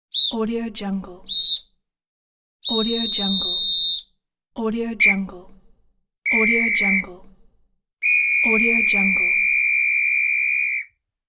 police whistle

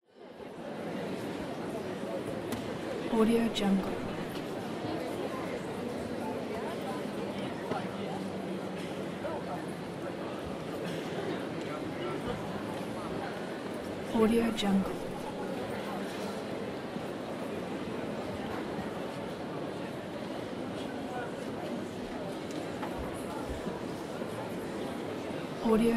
atmosphere in the crowd

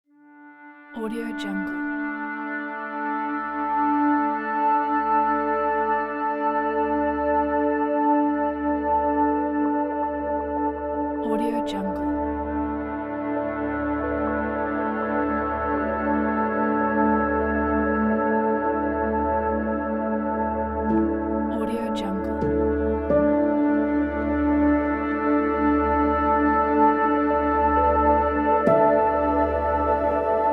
meditative ambient soundscape

